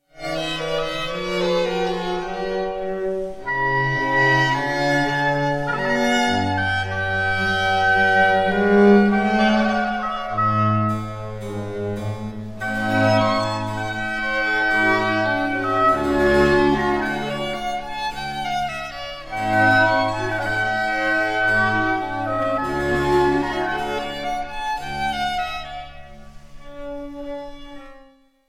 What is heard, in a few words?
sinfonia concertante in g major for 2 violins cello and orchestra; t284 1